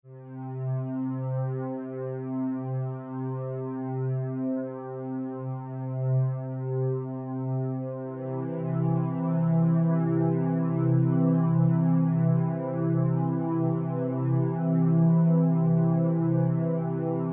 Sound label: Niterain: Relaxation Music; Relaxation Music for Healing and Stress Relief; 30-minute relaxation music with theta binaural beats for deep healing, stress r